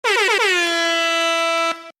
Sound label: four tap, airhorn